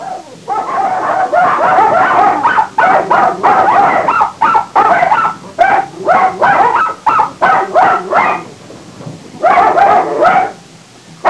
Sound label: litter of dogs; pets; Sound Effects for Multimedia - Volume 1